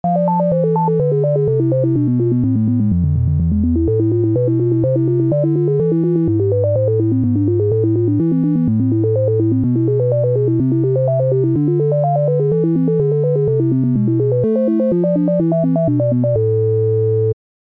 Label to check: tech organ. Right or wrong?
Right